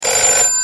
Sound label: ring, weapons